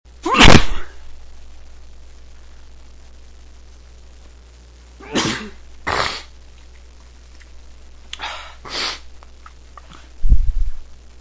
trying to be quiet, male

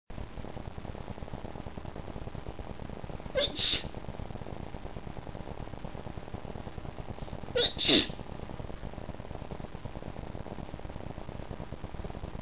shy sneeze, female

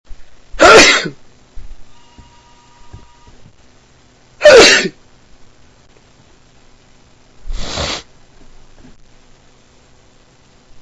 grass cutting

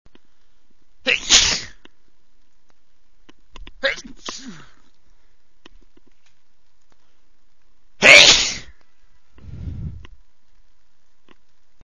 quick 3 sneezes, male

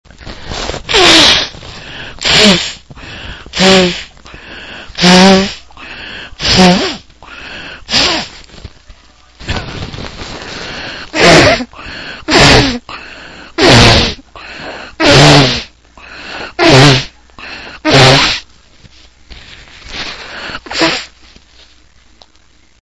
super sneeze nose blow, male

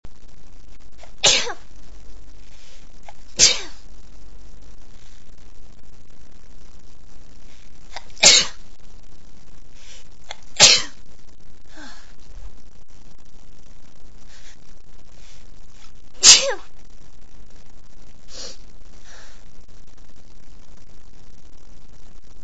CHOO, female

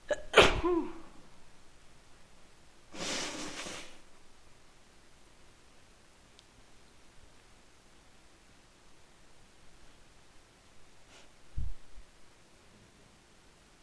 wet cold sneeze and blow, female

